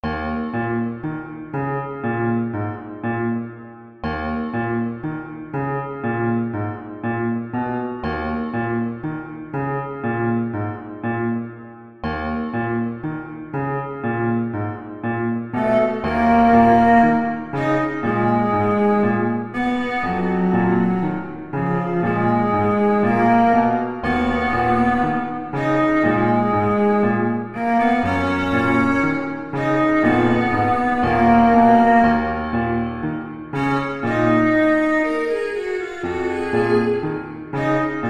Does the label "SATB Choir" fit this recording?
No